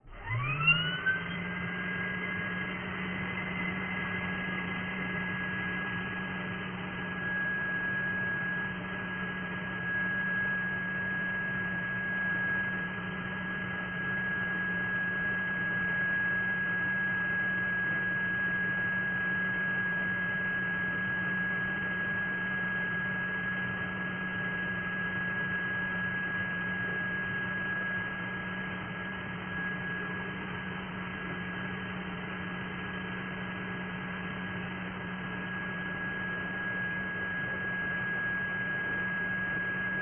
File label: very low pitch shifted vacuum cleaner sound effect